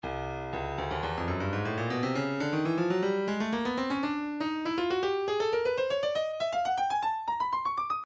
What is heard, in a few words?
breakbeat 060 bpm; Stylus Breakbeat Midi Files; 060 064 Bpm; Timba B